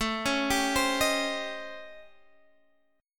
A 7th Sharp 9th Flat 5th A down Guitar Half Step Down